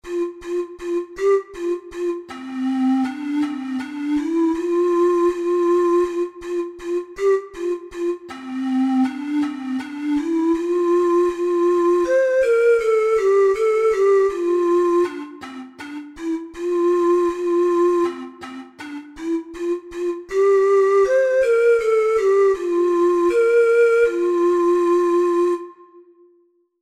good king, ocarina six hole

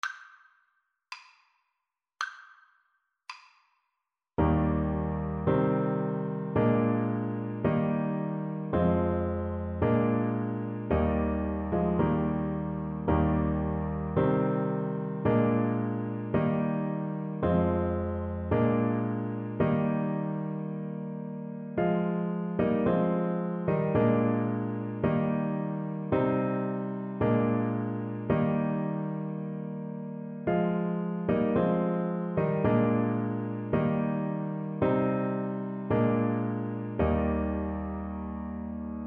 ki eshmra shabat trumpet

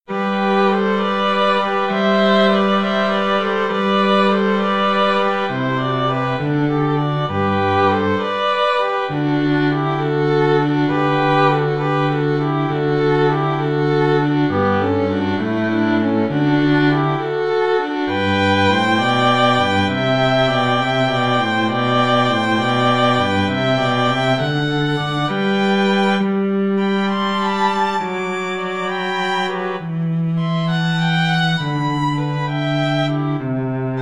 2 violins cello

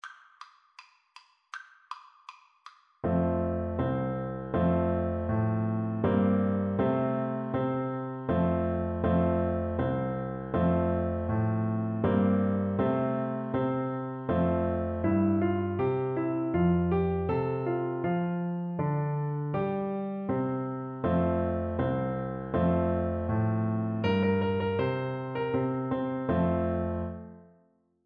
French Horn

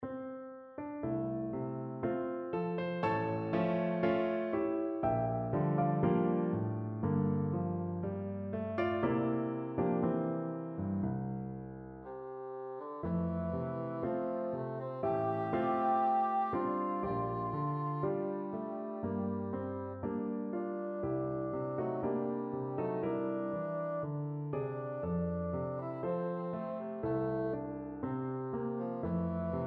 ponce estrellita, bassoon

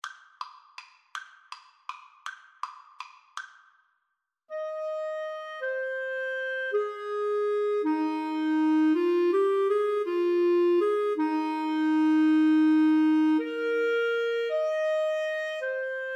Clarinet Duet

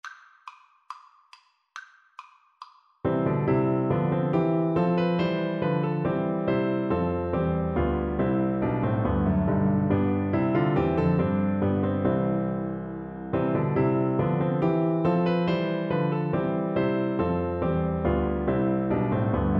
wir gehn nun wo, Flute

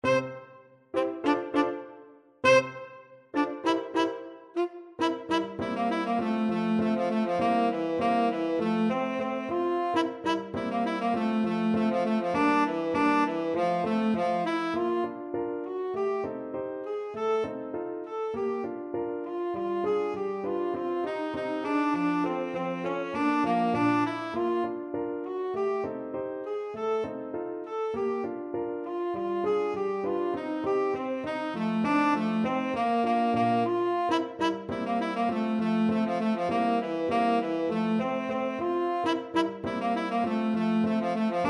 pizzicato polka tenor saxophone

